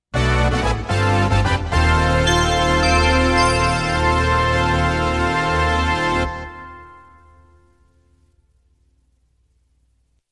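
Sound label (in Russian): zvuk zastavki, intro sound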